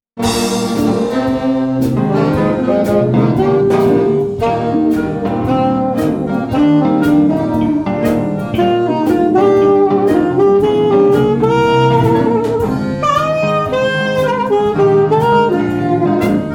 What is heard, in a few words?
sax; Solo; saxo